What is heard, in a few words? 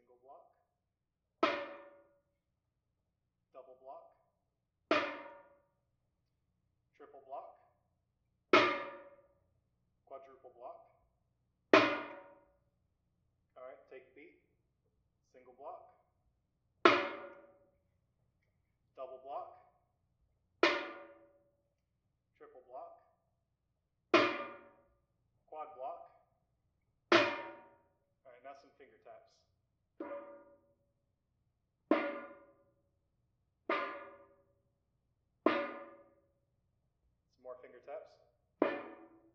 metallic drums